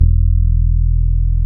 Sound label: Fretless Bass